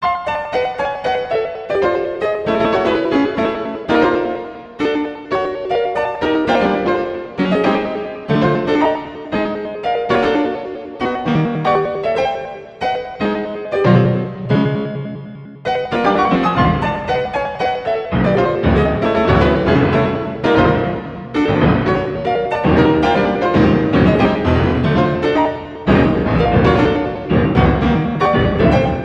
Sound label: horrorhouse